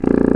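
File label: contra bass; Proteus2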